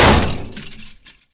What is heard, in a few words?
chainlink bash; scripted sequences, a2